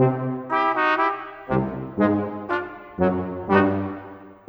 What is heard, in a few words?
Brass, Cinematic; Sonic Foundry (Sony Creative Software); Orchestral Series VOL-3; Cinematic 22